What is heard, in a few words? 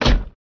Car Door Slam Ext Hit S